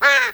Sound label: alert, crow; Half Life Model Pack; Half Life 2